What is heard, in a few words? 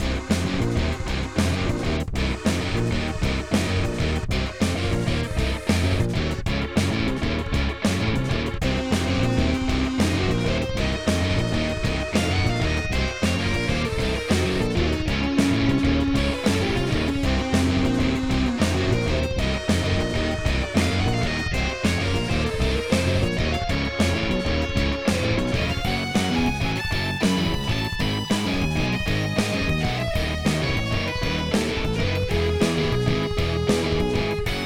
music (Factory Boss Loop); Factory Boss Ph2 Loop